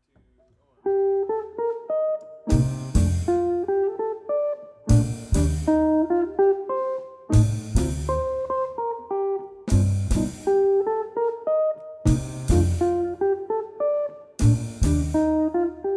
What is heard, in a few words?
Swing Guitar Trio; Swing Forward